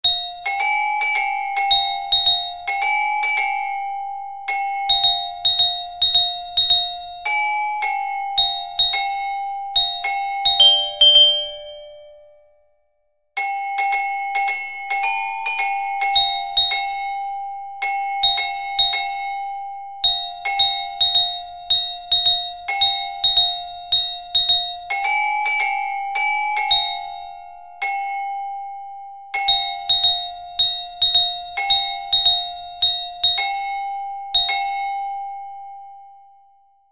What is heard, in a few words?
zukorobasi, orgel